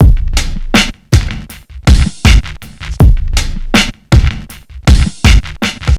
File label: Street Tip, R&B Drums